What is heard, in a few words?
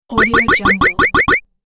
multi jump game player character action